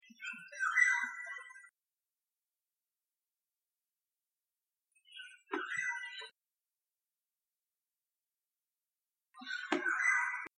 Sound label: oriole call